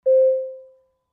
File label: Aircraft Bell Announ, warning signals